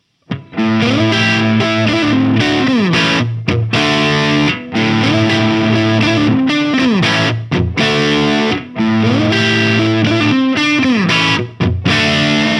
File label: Ibanez RG 550 DY Pickup 1 5 Crunch